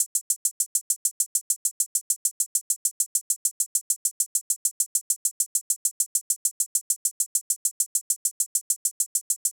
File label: Drum Loop - 100 BPM Hihat; Midtempo; Cymatics; ANALOG EVOLUTION Beta